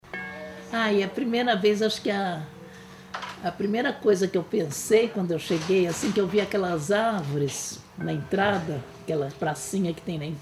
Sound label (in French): mix repet cloche